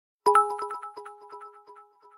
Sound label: twitch bits donation sound effect